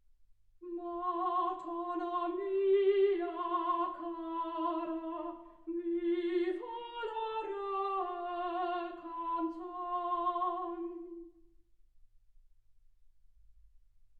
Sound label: soprano